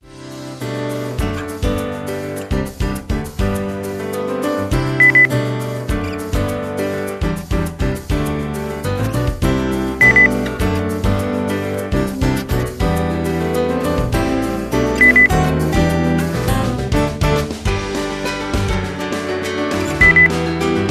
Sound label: pop texmex beep